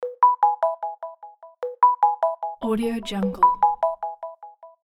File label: sweet and simple ringtone